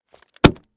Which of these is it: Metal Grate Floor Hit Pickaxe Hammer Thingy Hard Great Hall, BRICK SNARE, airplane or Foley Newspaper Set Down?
Foley Newspaper Set Down